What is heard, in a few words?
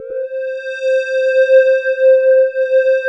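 SD1 SQUARE, Synthesizers; Soli-Music; World of Synthesizers VOL-1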